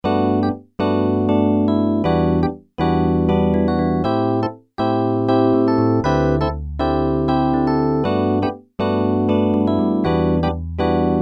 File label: piano chorus dx21, yamaha dx21